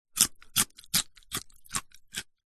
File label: human eat bite carrot raw munching; zapsplat